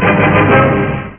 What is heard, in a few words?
Shut Down; PowerLock II